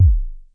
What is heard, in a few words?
low bass, Roland SH09, drum machines